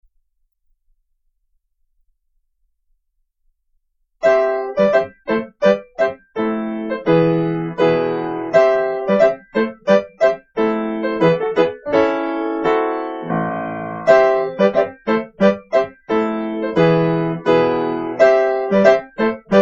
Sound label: procession